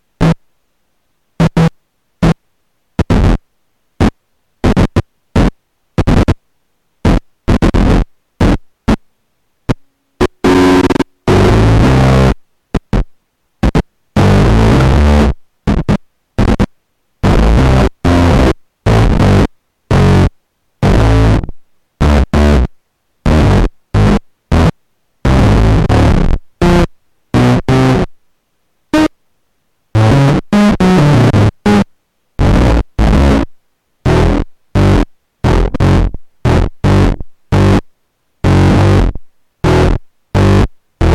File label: evil, chunk systems octavius squeezer